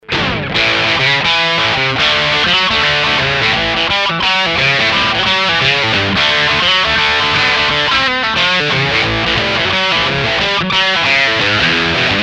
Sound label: bridge dirty; steel foundry; axetec